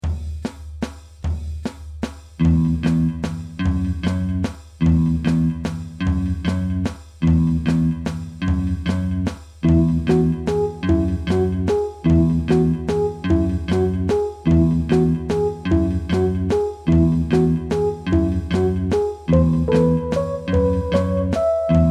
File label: GROOVE